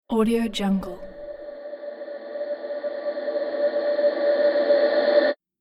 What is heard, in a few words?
ghost future abrupt